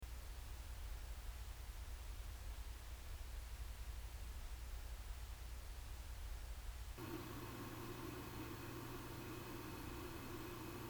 silverstone gd10 load nh12l nofilter 70pc stock 90pc